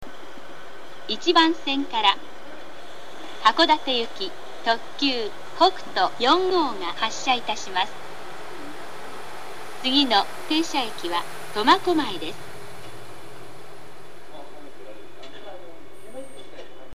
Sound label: limited express; minami-chitose; hokuto4; hakodate; jr-hokkaido